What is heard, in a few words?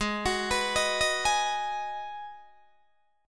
G sharp Minor 7th G sharp, Guitar Open D